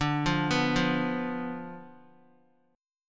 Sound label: D Minor 6th Guitar; Dm6 arpeggiated; JGuitar